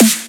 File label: soft hit clap